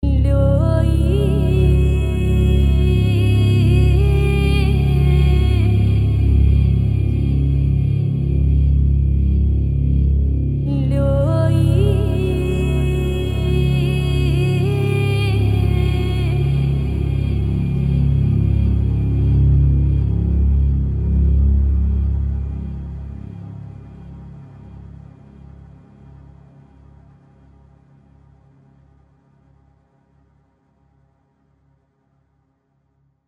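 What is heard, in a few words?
90 C sharp Vox Atmos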